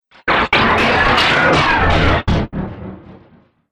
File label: Science Fiction Laser Glitched; Sci-Fi